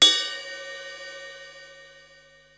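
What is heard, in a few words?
cymbal ride cup; 300 Drum Machines; Yamaha Motif ES-Series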